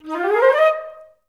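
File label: flute run up D#3; Best Service; Peter Siedlaczek's Advanced Orchestra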